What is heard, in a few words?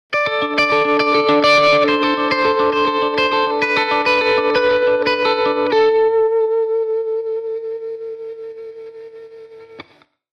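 Electric Guitar Rock Arpeggio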